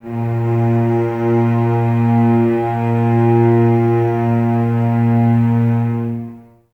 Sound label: Cellos A#2, String Ensembles, No Vib; Miroslav Vitous